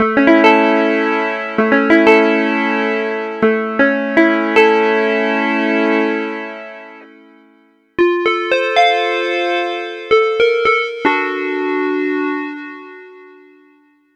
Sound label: crash, reface cs; mac; yamaha; mess arounds